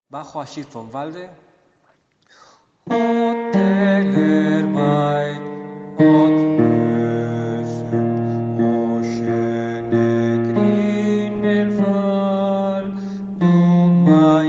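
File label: bass; Abschied vom Walde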